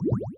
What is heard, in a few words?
Underwater